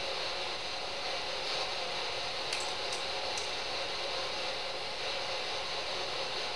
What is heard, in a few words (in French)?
bruits verre